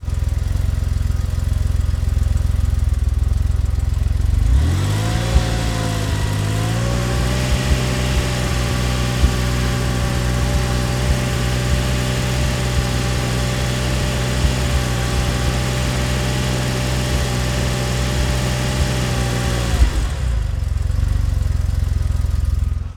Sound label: Porsche 911T Fast Idle, Automobiles